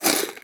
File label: premium currency spend